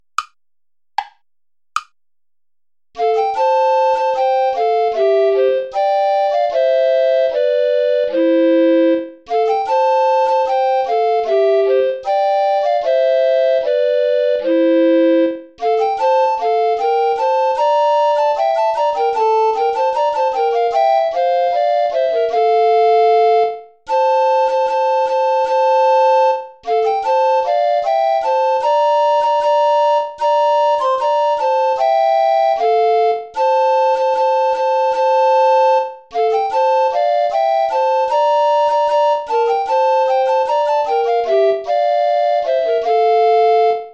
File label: bransle gentil play along without bass recorder; praetorius michael